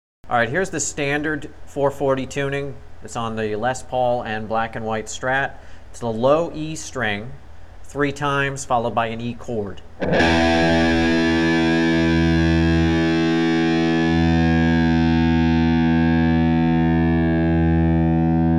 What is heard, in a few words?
standard tuning, guitar